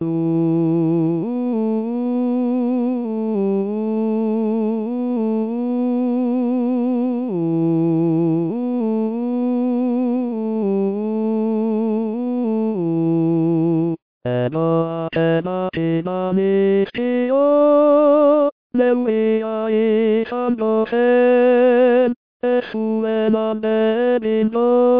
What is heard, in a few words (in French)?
Tenor